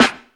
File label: SD, dance drums hip